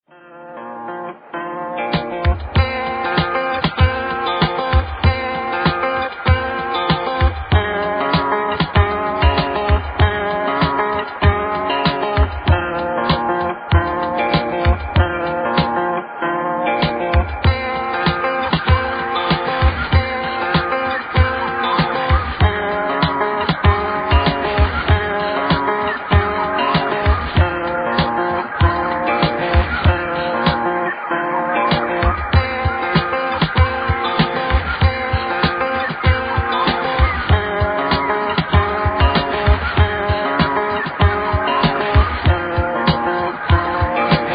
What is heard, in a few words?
music; directional sound